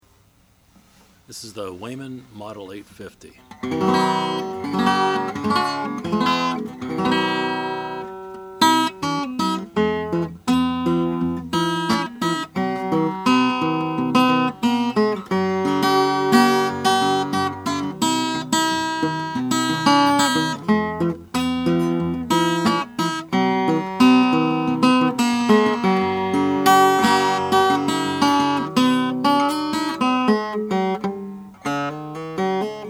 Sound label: weymann son 850 natural mahogany; 1930